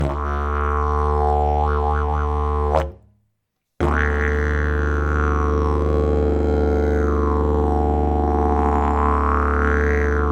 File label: didgeridoo; javor technodesign